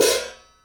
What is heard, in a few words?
hat